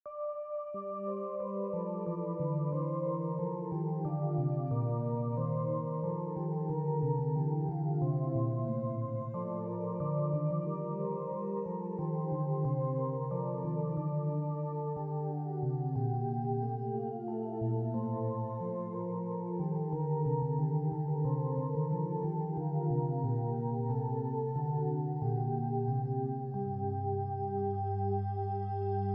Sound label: keyboard